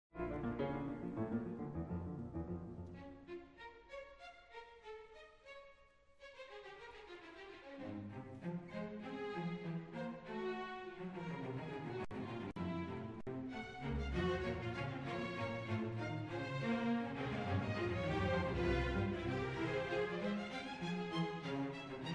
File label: Pno Rattle